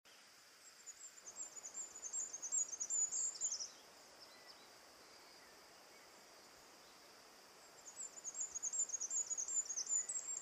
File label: Goldcrest Regulus regulus